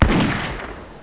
MUSKET; 枪炮声音素材

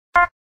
timer warning; Seewo; EasiNote5

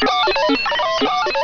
COMPUT; 机器声音音乐素材

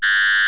laser

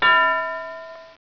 BELL

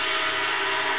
HUM, 机器

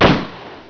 UZI; 枪炮声音素材

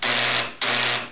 BUZZ, 警报

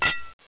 CRYSTAL

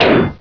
THUD, 碰撞声音素材

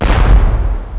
cannon, 爆炸; 爆炸系列声音素材

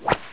WHIP

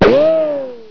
RICO, 枪炮声音素材

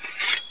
sword

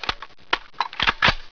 LOAD, 枪炮声音素材

